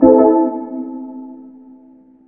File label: PIANO HIT; USB Soundscan vol.27